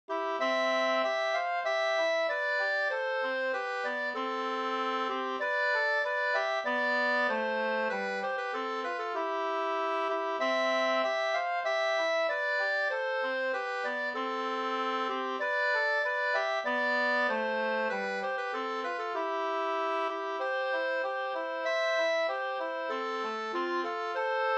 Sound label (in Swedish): brudmarsch; fr Sibbo